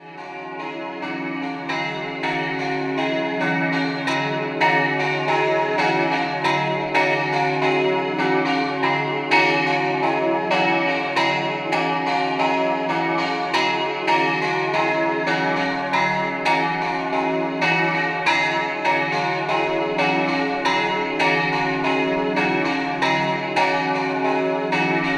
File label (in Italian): Toppo, campane